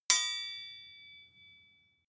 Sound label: sword hit ring soft